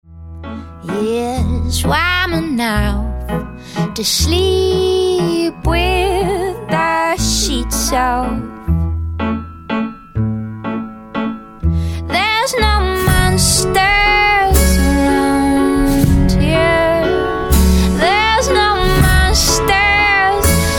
Waltz In The Snow

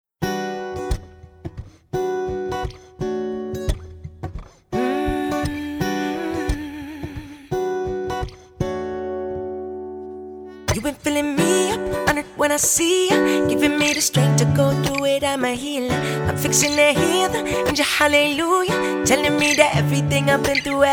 Acoustic

